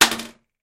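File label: toilet seat sounds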